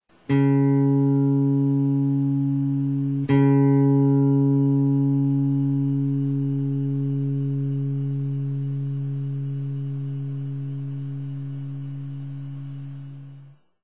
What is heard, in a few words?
acoustic tune up 4th